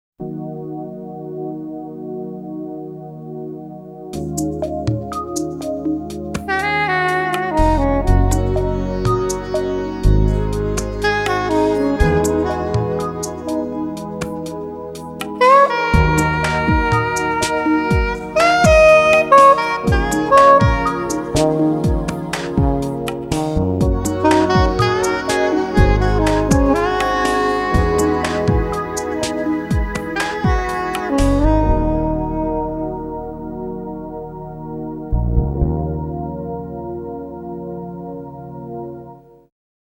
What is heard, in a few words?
Sopran Tune